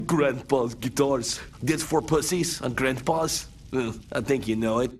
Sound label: guitars; metalocalypse; grandpas